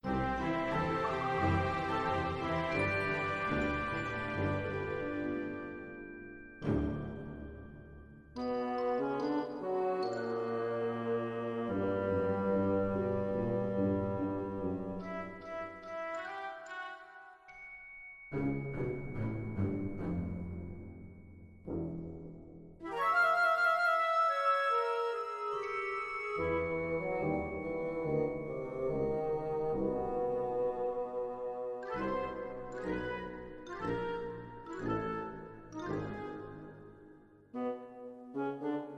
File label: Orchestral Score